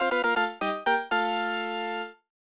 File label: Level Up!; Torneco no Daibouken: Fushigi no Dungeon; SNES